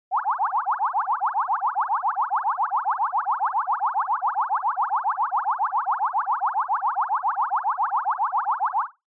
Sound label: Panic Fast; Onoma